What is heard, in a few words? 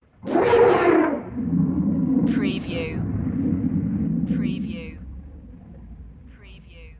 Dinosaur Screech Growl